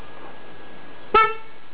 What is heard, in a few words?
horn